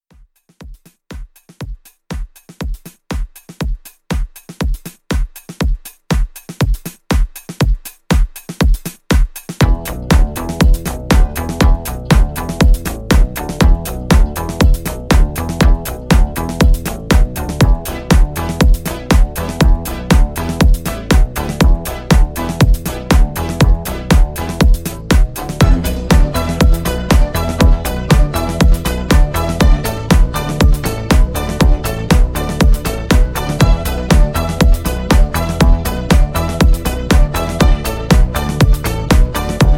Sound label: 60s ReDrum Intro Outro; Remixfreakz